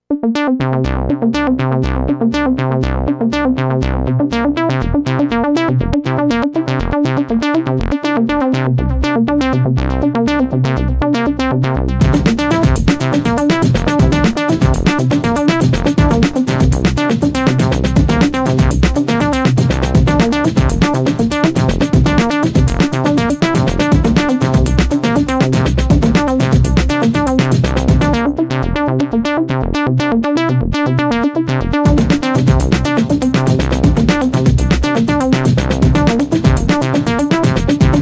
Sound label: drum; modcan